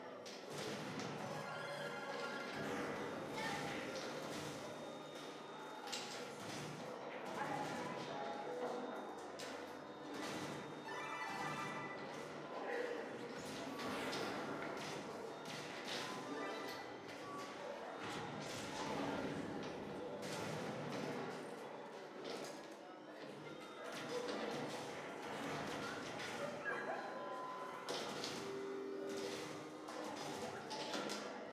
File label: amb